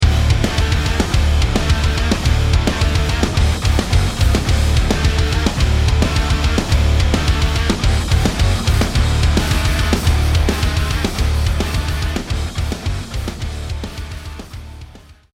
start action

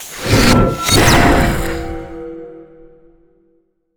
dark magic conjure blast

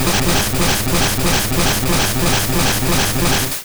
Hydro Pump

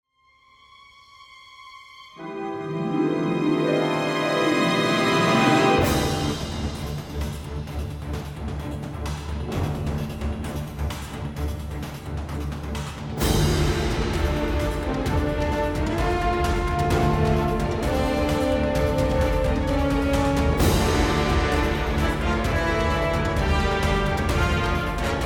Field BGM; Xenoblade Chronicles 3

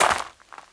walk gravel; lucius

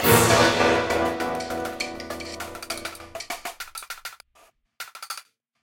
Fight High Fail Combo; Remember Me

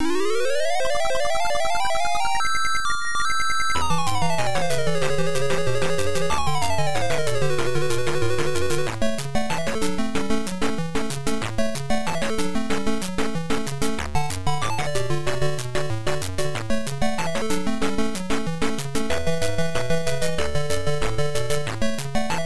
Round Clear; Super Qix; arcade

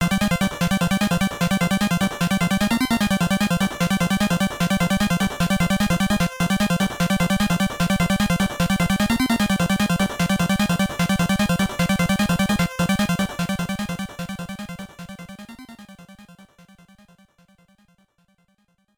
Alert; The Krion Conquest; nes

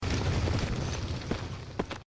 Slave Attack Burn Get Up; Goddess of Trampling

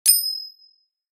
Bell

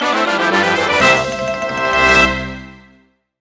Congratulations!

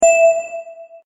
Levelup; Game Dev Tycoon

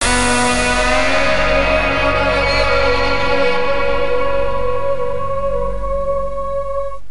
Victory; Alien Victory; Lego Battles 2009 NDS

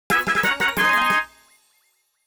Item Get Jingle; nintendo labo toy-con 01 variety kit

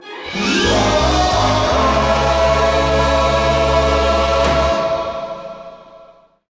Goal Fanfare; La Brea Car Pits; 3rd Place

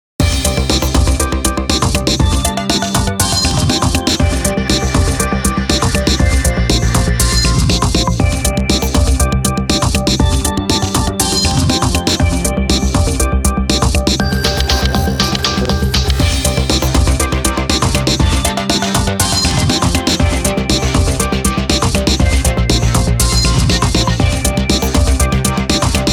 Echo Chime; jubeat saucer; Gourzaemon

